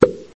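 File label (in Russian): поп корн одиночный короткий приглушённый близкий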